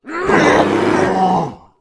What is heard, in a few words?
fall, orc lord; monster